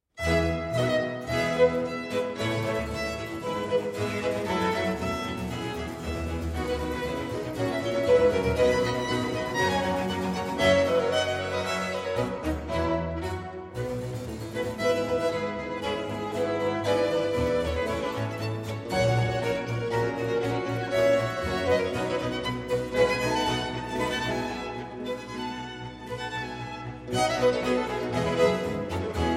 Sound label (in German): F-Dur 2. Satz; frantisek jiranek concertos